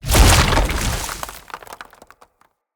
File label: Wrath Head Spike Spawn; Bromius; Abilities